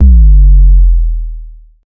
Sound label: quick edm house music bas, bass drop